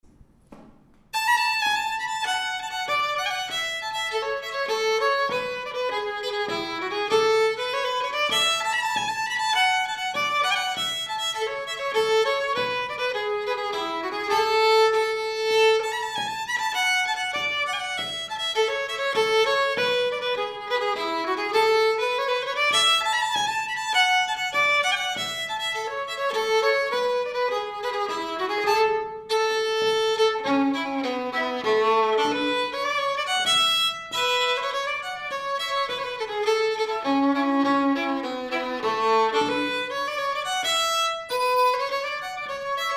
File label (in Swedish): Polska; efter Nylandspojkarna Uppifrån